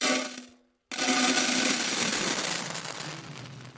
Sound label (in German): Geräuschewerkstatt Donnerreis; Klangexperimenten